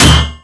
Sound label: iceaxe impact; weapons; hl2